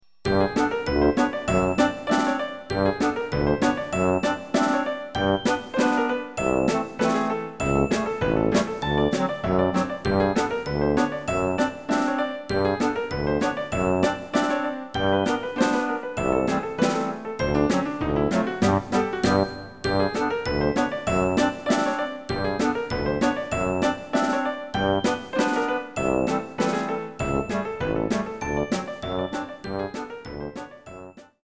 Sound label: Chromatic Polka; Kohler